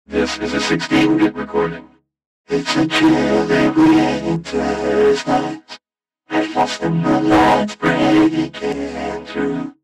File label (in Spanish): salida vocoder